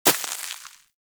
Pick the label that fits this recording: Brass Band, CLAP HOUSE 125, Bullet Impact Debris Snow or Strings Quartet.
Bullet Impact Debris Snow